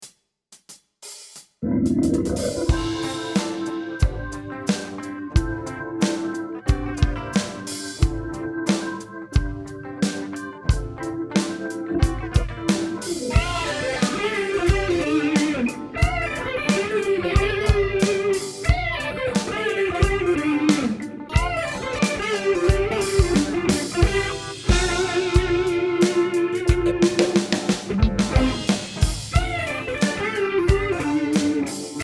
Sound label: break no bass